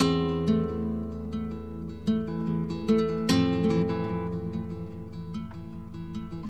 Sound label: guitar, Sad